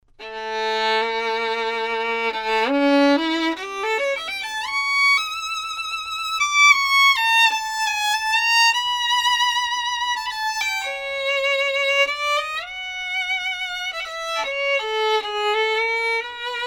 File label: violin, professional